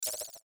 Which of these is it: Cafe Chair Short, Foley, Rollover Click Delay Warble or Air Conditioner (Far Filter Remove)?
Rollover Click Delay Warble